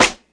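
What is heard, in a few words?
classic hip hop snare; Classic Hip Hop Mega Kit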